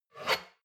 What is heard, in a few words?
shot glass grab table slide hard, gastronomie; berufe; soundpool